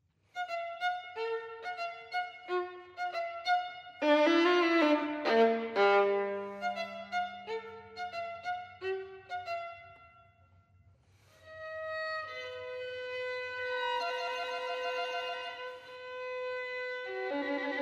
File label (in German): Sonate Nr. 2 für Violine solo